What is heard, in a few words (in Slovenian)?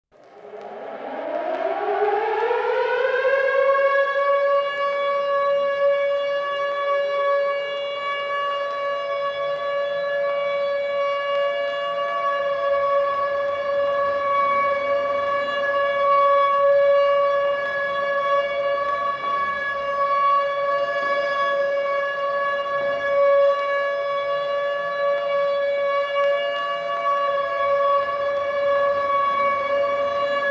sirena